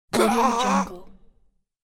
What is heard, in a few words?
low male kill moan; ناله مرد بعد از چاقو; human sounds